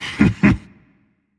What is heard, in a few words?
heh heh rage; chaac